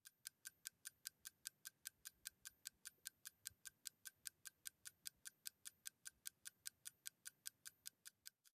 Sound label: ambient port default; World Of Warships